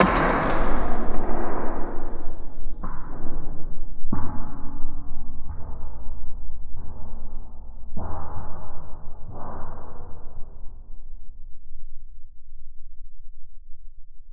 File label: BOOM Reflex Guider, Cinematic Metal Impacts